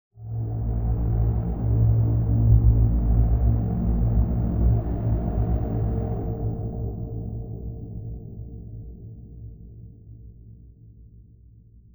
Ambiance Suspense; polygoniix Intro Essentials pt1

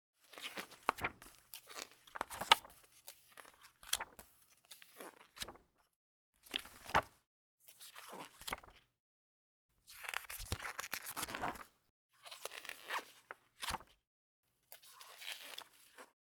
turn paper journal creak

